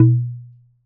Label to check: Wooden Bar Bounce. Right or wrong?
Right